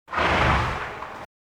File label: Animal effects snorts grunts farm ambience